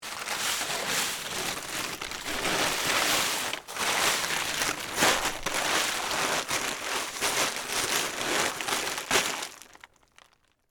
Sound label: Plastic Tarp Fold, Foley; Plastic Sound Effects; Plastic (Sound Effects:Materials); BlastWave